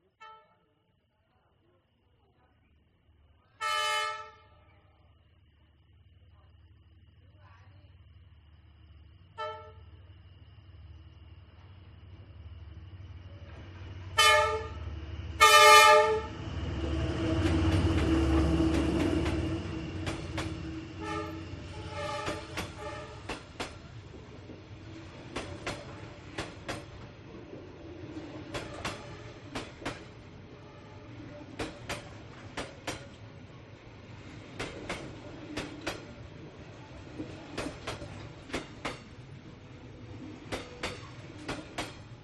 train honk approaching pass followed by carriages hanoi vietnam